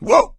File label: ledge hang slip; voice; source engine; left 4 dead 2; survivor